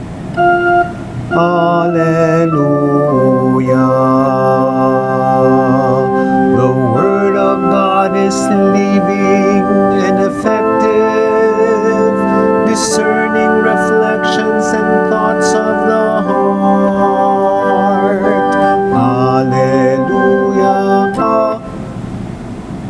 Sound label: Alleluia Verse; OT 29th Sunday